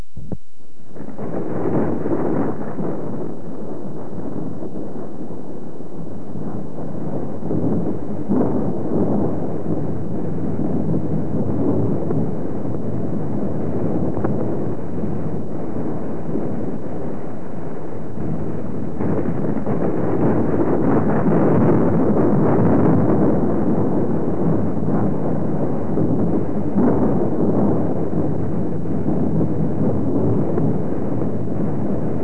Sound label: rain